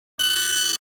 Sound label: computer printer; NCH Library